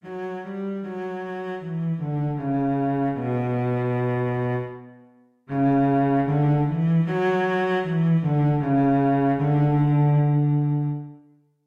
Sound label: Cello Sight Reading Exercise; Cantabile Alla Marcia